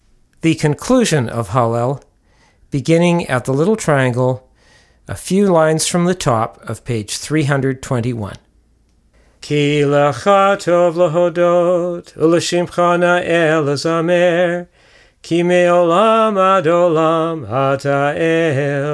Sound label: Concluding Blessing, Hallel